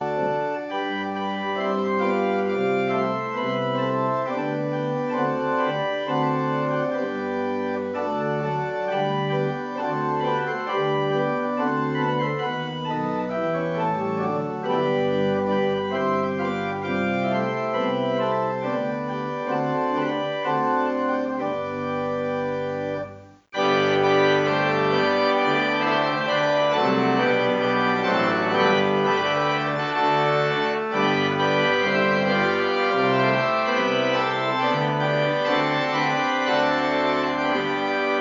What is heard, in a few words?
Organ; Joyful, Joyful, We Adore Thee